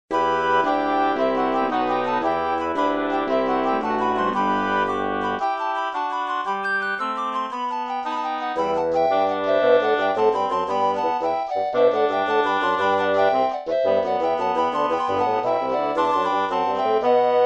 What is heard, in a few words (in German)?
vorspiel